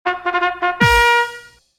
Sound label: complete; museum complete; Line Pokopang